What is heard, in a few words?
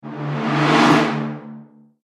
Cinematic Trailer Whoosh Impact